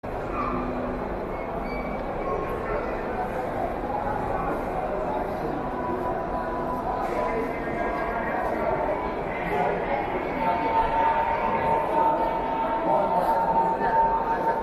Bus and passenger; داد و بیداد موقع مسافرزدن

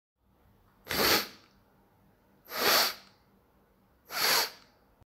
nose sniff

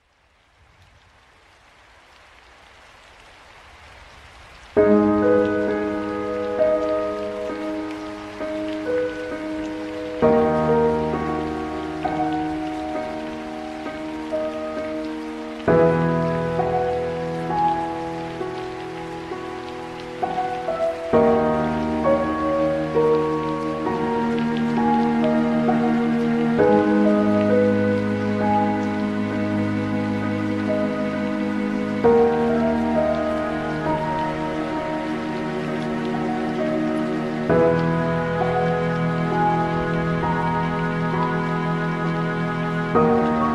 Rain and violin

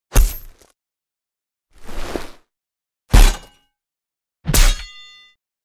Armor Hits

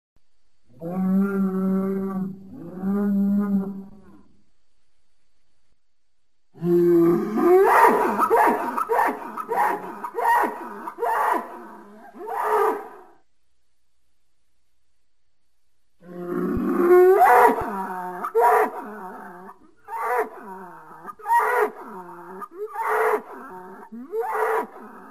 Domestic water buffalo

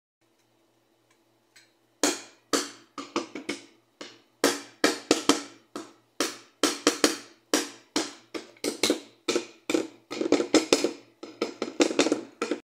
Wooden containers; ظروف چوبی

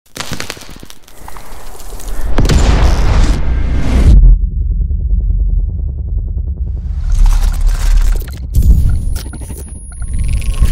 Particles

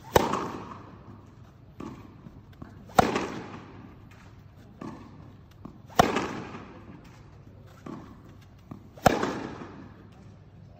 tennis

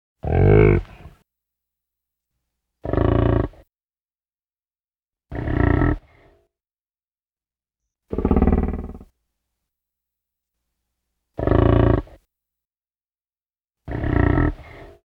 Buffalo Honk with Inhale

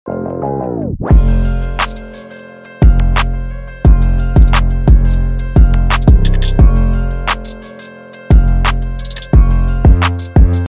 hip hop; موسیقی هیپ هاپ بی کلام

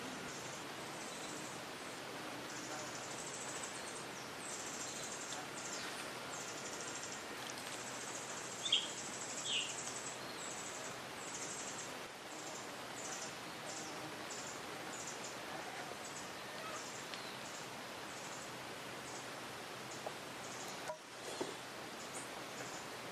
Rufous throated sapphire; پرنده یاقوتی گلوحنایی

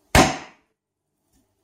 Hit the table

